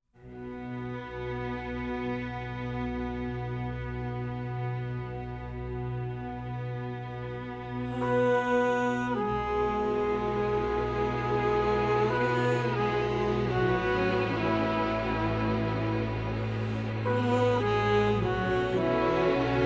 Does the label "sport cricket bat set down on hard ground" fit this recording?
No